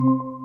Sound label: google meet join